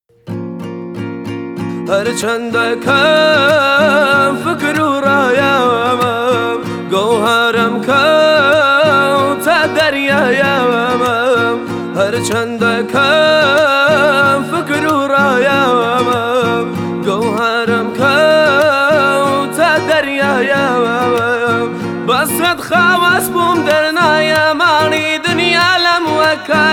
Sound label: kordi ba gitar; kordi ghmgin